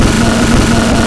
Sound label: el kreslo